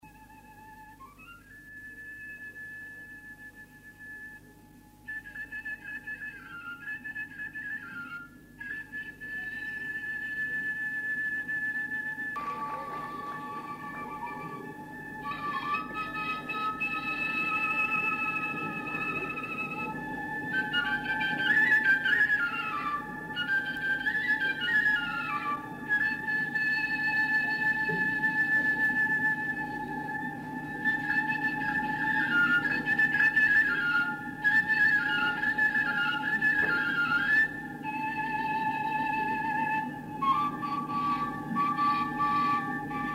Doina la fluier; Fonoteca Arhivei de Folclor Vasile Adascalitei - CJCPCT Vaslui; cantece de dor si jale

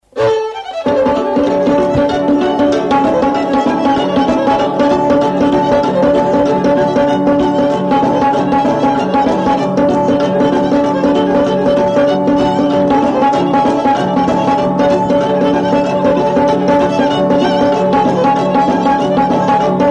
Sarba taraf; jocuri si cantece batranesti; Vaslui

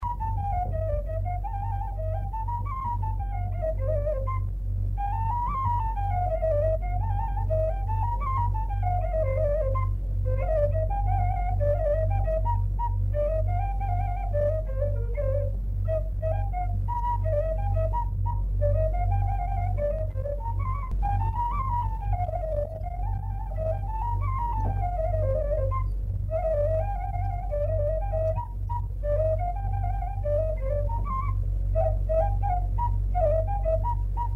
Alunelul la fluier; jocuri si cantece batranesti; Vaslui

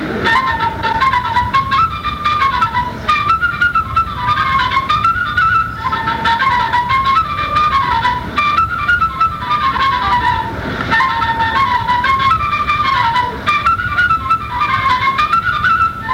Sarba Batuta, joc popular vasluian; Centrul Judetean pentru Conservarea si Promovarea Culturii Traditionale Vaslui

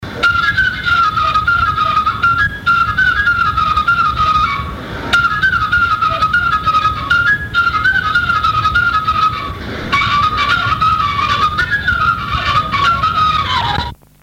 Batuta, joc popular vasluian; Centrul Judetean pentru Conservarea si Promovarea Culturii Traditionale Vaslui

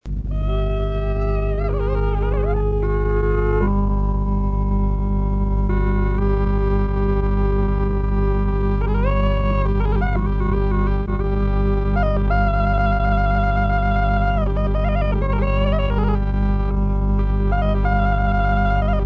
Doina la cimpoi; jocuri si cantece batranesti; Vaslui

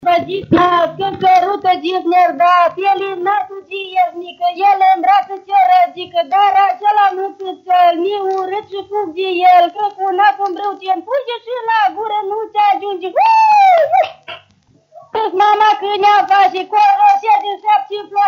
Strigaturi; Vaslui

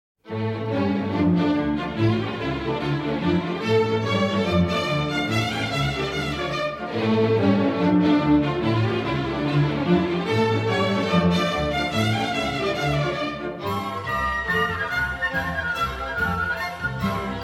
dansuri simfonice; UCMR - UNIUNEA COMPOZITORILOR SI MUZICOLOGILOR DIN ROMANIA; Creatii simfonice romanesti; Tiberiu Brediceanu Patru dansuri simfonice Braul

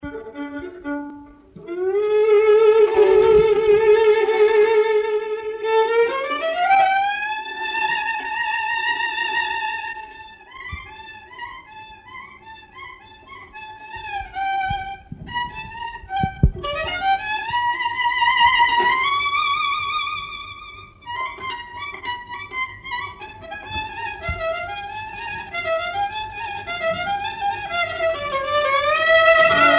Doina la vioara, cantece de dor si jale; Fonoteca Arhivei de Folclor Vasile Adascalitei - CJCPCT Vaslui

